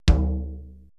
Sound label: PERC MIX